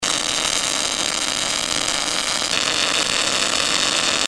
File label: globular transfer, welding